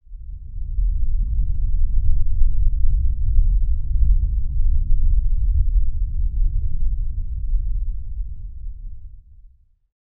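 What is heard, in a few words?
A Rumble Of The Ground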